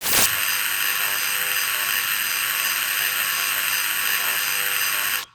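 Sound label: Weapon laser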